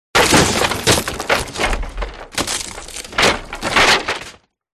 crashes wood; Audio Mangler

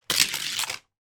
toy car launch on race track and loop the loop

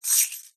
foley keys large bunch prison guard grasp in hand

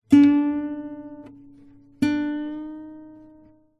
musical guitar nylon tune string

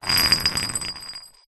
metal bar small roll hard ground; foley

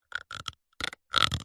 plastic thin squeeze pressure creak; foley

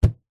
balloon inflated catch

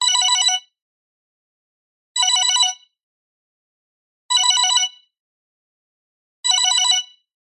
multimedia ringtone smartphone simple

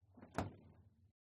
Kitchen Refrigerator Side By Side Door Open; uberduo

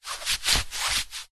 foley fabric thick short movement; zapsplat

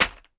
packaging plastic drop; sound effects one

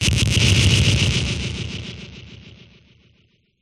Blasts Synth Delayed Oneshot; sound spark

